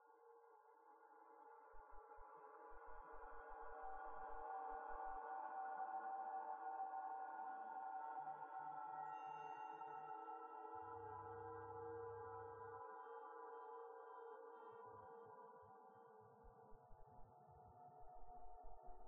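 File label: Audio Drone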